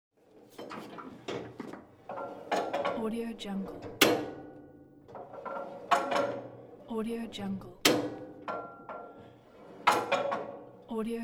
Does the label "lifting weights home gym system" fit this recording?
Yes